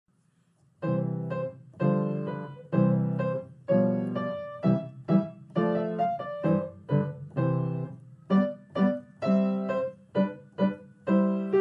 piano

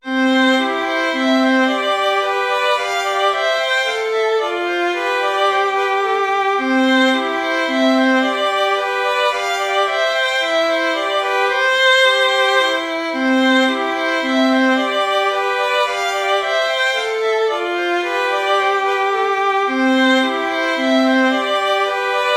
tempo minuetto; hook james

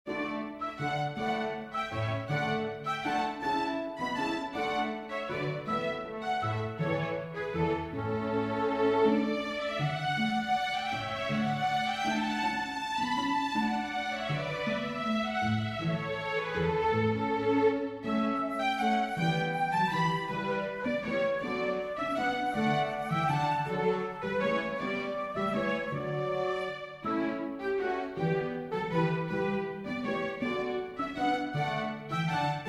for winds strings; purcell henry; canaries

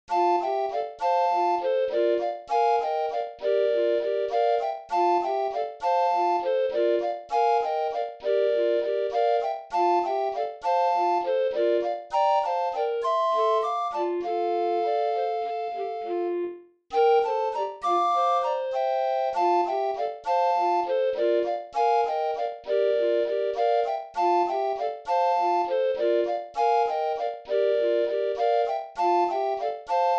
bossa; luna

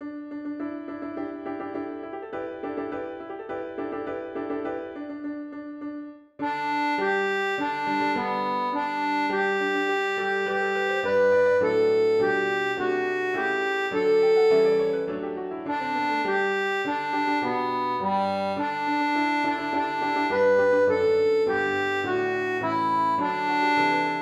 hymne national australie

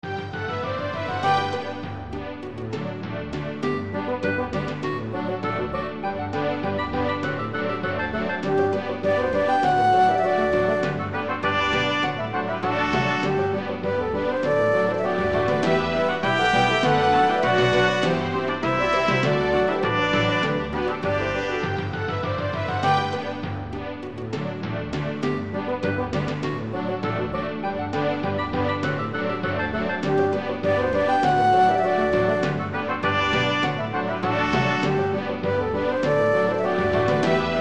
polka

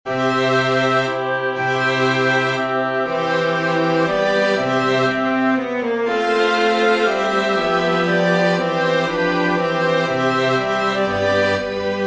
veni sancte spiritus for winds strings; maschek caspar

